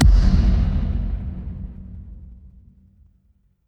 transition impact hit

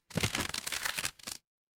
paper fabric crumple

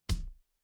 retro game hit

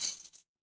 glass shatter

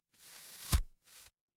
paper fabric fabric

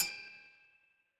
glass clink

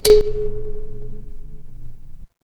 instrument kalimba

